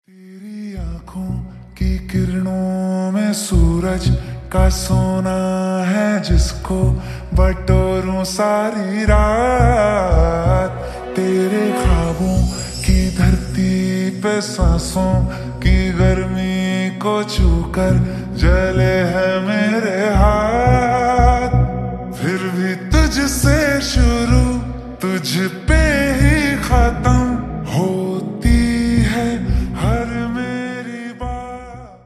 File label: Slowed Reverb Ringtone